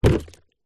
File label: household mop bucket plastic shallow water set down floor